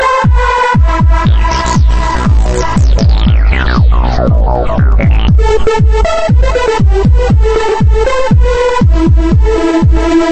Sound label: Hard Bass; Airtel